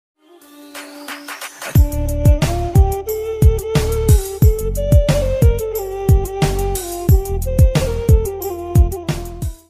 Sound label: flute ringtone